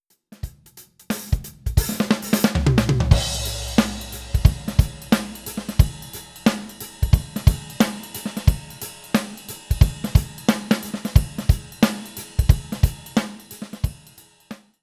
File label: half time shuffle